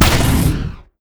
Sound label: hero shoot